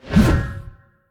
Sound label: atlas swing; smite; god atlas